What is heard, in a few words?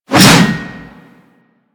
Axe Swing; Warframe; Weapons